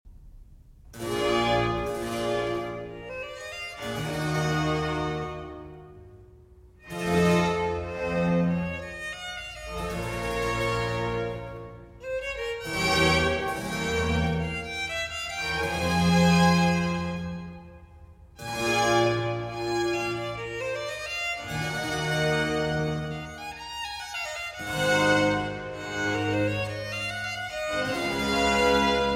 Concerto for Strings and Continuo in G major

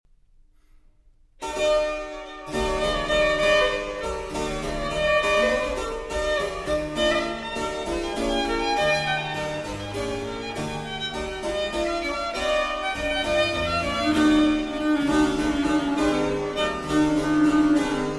Violin Sonatas, Sonata in E Major, Courente; 12 Violin Sonatas, Livre 2, Sonata 12

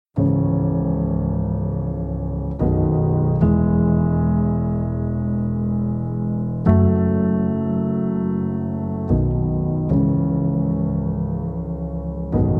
Karaoke Version

